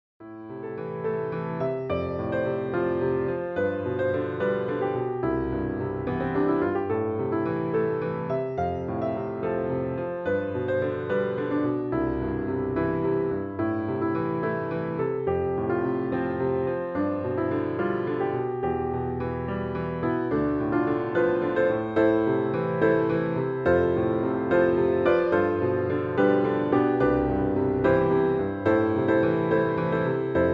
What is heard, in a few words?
pno solo